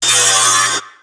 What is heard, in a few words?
tango cut; weapons; dota; shredder